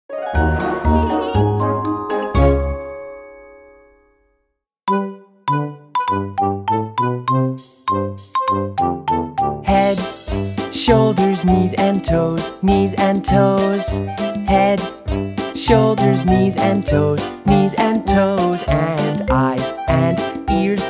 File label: Head Shoulders Knees Toes Speeding Up Nursery Rhyme